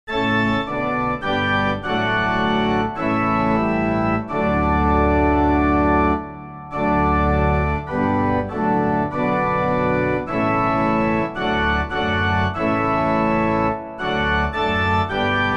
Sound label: inst; Kirchenlieder; ich singe dir mit herz und mund